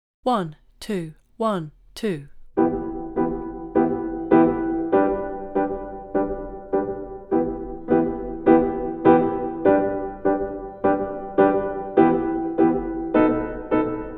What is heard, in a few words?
When Jonny Comes Marching Home backing